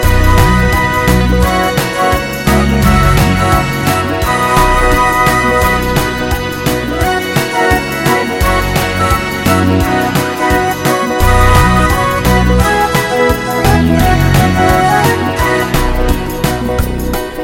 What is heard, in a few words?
Hot Mambo